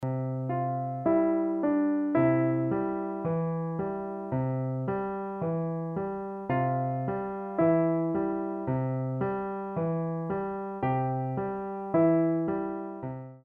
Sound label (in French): improvisation, piano